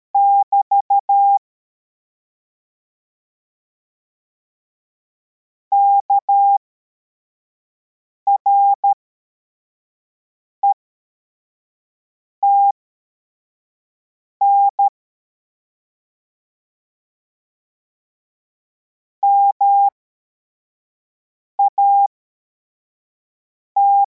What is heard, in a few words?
cw, morse